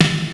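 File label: DDD5 snare drum; Crypton - DrumBase5000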